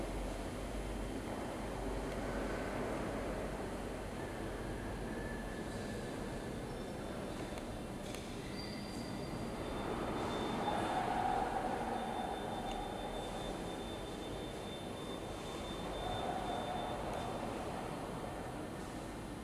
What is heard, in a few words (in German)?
darmstadt hbf schluessel